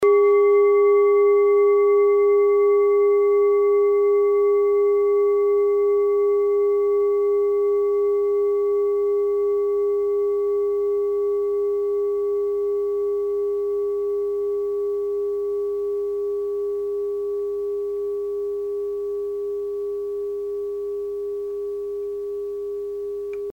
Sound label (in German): kleine klangschale; Diese Klangschale ist eine Handarbeit